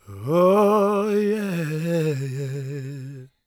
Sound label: GOSP MALE, Vocal Gospel; Vocal Planet Vol1 Gospel